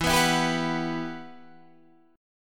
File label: E Minor Add 9th; madd9:1,b3,(5),9